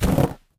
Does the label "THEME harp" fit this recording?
No